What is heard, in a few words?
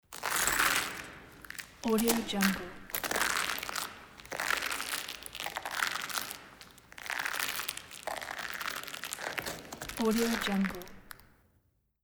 eggshells walking